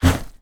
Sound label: Farming Dig Hoe Soil Dirt Garden; Sunflower Land